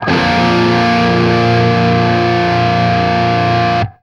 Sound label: open A#, open chords, guitar; East West; Steve Stevens Guitar Samples Collection